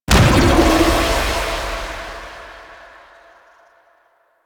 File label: Pod Void Pulse; Warframe; Liset; Hub; Lower Deck